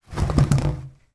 household toilet roll on holder pull spin

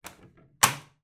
household boiler control panel door close

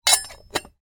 silverware pot lid movement put on close; household

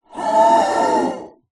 horror monster demon screech

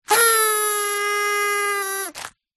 party horn blow paper tube roll out; zapsplat; foley

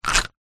leisure fishing reel turning reeling small short; zapsplat

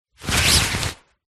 foley jacket raincoat arm body movement single; zapsplat

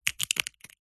egg shell movement crack lightly; zapsplat

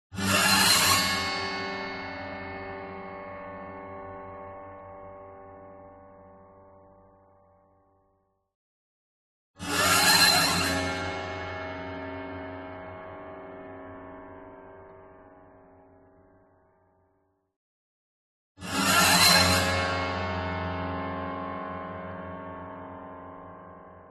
CINEMATIC HORROR Piano Bow String Broken High; smartsound

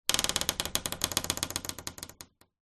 household door creak old house

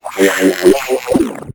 Robot; little robot sound factory

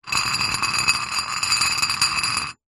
foley metal disc scrape concrete ground; zapsplat

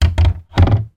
household cupboard door close very lite; zapsplat

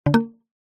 multimedia alert generic mallet wood

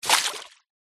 fishing net splash hit water fast

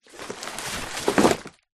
cardboard flattened many movement

